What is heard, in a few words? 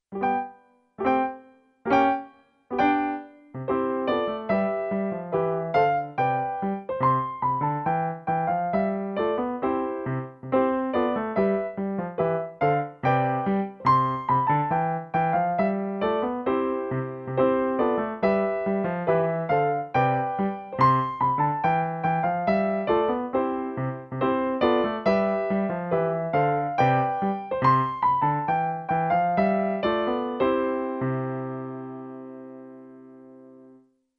PIANO; O cachorro